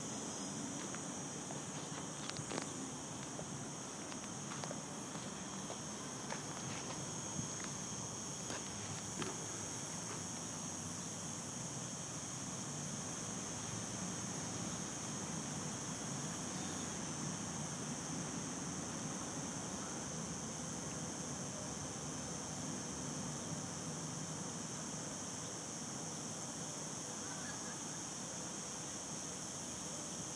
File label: cicadas outside archaeological museum sevilla